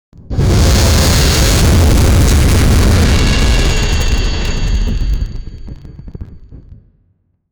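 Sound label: create an intense and immersive, electricity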